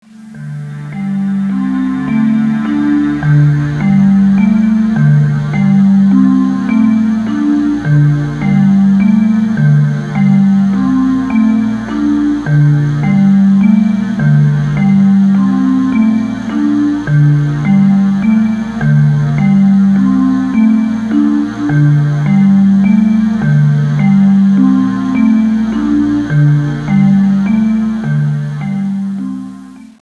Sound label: Mono 8-bit